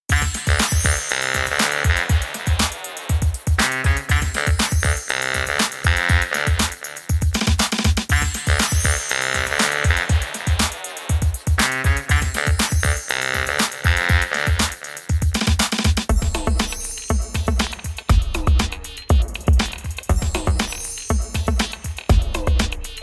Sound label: bari bust